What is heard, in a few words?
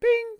Ping